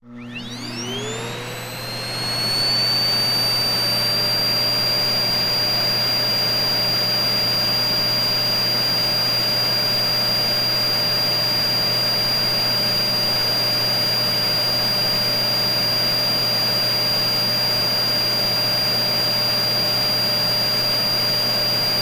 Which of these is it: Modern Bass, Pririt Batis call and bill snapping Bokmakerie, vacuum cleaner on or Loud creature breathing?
vacuum cleaner on